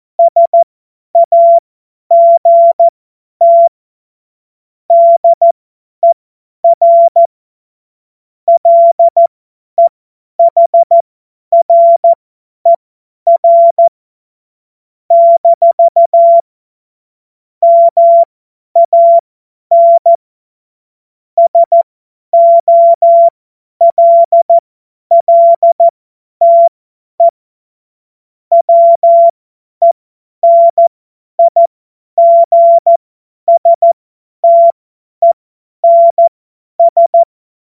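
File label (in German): Witz, cw morsen; Morsekurs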